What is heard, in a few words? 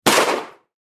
gunshot sound